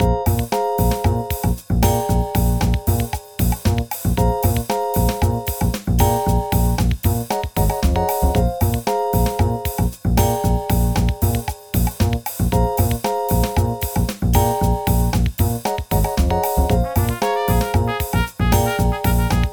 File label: Trio